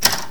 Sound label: Plunger